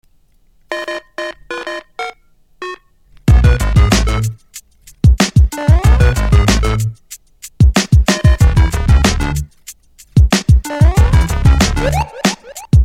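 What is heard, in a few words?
Super Mario Instrumental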